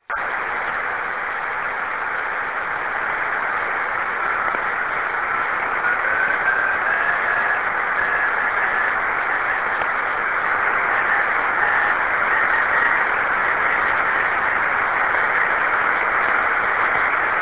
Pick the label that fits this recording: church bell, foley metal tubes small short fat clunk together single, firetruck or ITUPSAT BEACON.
ITUPSAT BEACON